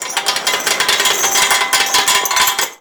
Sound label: Chain Fall; Warframe; Mod Quest; Teshin's Hideout; Teshins Dojo